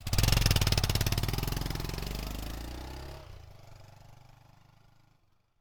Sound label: bike start